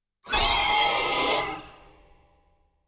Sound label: roar; megaguirus